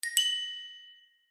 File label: multimedia correct ping tone